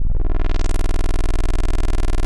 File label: static, Retro Sounds 32-Bit